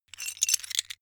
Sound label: metal pieces clatter short